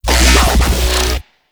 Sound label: Infested Shotgun Growl; Weapons